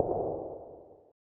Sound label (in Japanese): 足音; LcdDem SFX